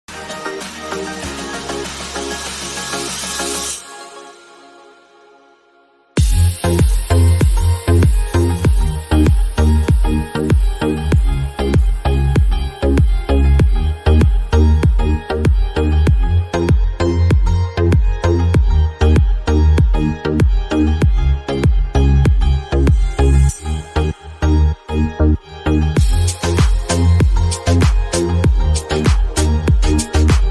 love bgm ringtone tamil flute

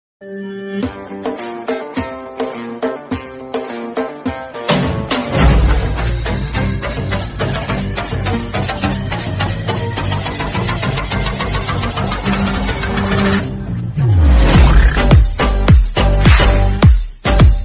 bgm ringtone, theme song; pathan

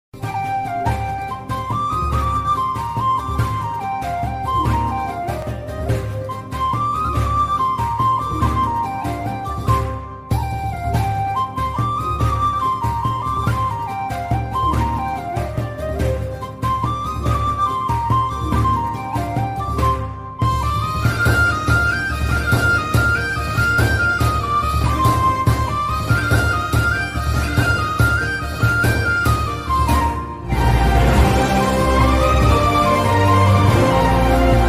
flute ringtone

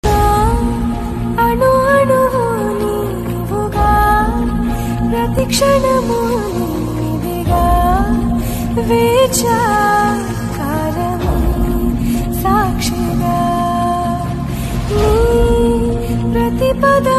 song female; kgf

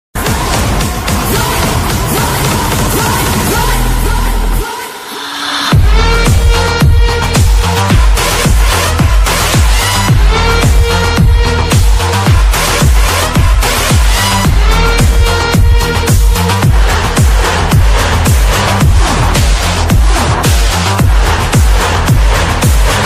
new movie ring tone bgm ringtone; toxic; rocking star yash

